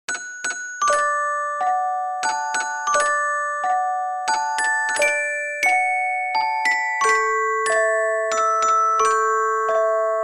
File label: Lullaby Brahms; The Sims 4